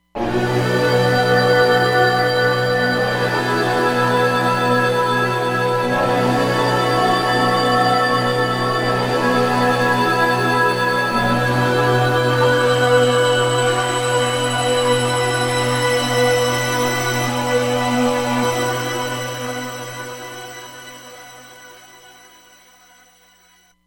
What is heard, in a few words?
Metal Swell, Roland Fantom XR